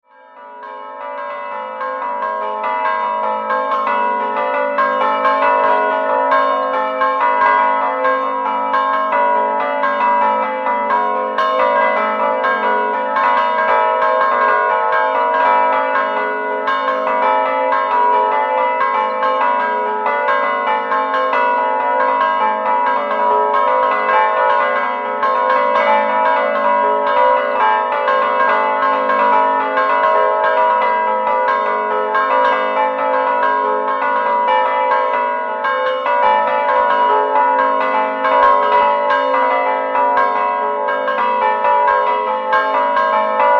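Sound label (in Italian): CIVIDALE San Biagio, campane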